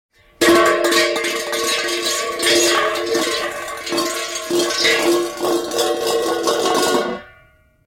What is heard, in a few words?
Hub Cap Rolls On Asphalt; Cars